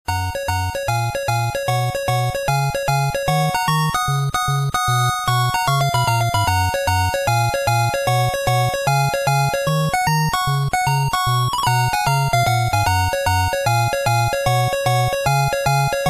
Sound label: Password Screen